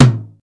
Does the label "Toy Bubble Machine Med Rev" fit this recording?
No